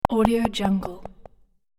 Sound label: knocking cartoon descent